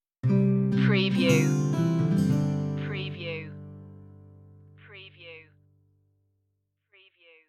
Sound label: christmas spirit stinger; cosy